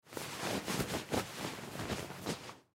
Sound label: Bed Sheet Movement, Bed Sheet Movement Wiggle Cloth; Household